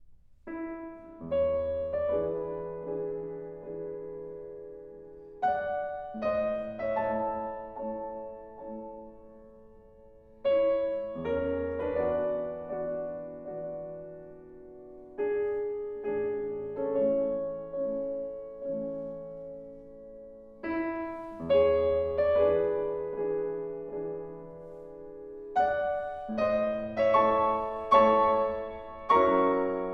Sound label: Chopin 24 Preludes, Op 28 No 7 In A Major